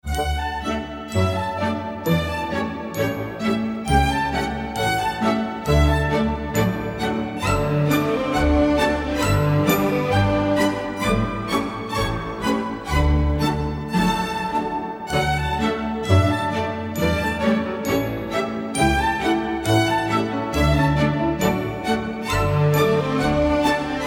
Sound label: annen-polka; johann strauss